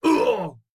flinch med; Rust

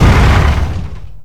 Bradley Engine Shut Off; DCS

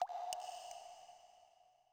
water drip; Rust

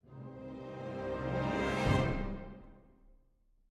music interactive; World of Tanks

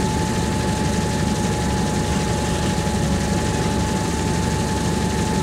hetzer engine rpm 33, tanks; War Thunder

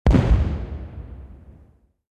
aa explosion far

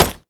asphalt run

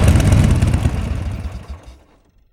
zodiac boat shutdown; Call of Duty Modern Warfare

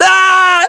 death

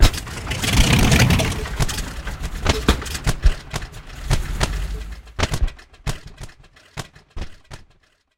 overheat

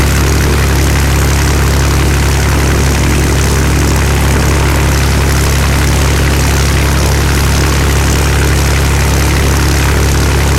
mustang side, engines; War Thunder

